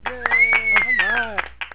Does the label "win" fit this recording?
Yes